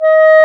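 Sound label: CLARINET D#5, ORCHESTRA; Roland - LA Composer vol. 1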